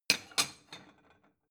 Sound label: plate small shelve slide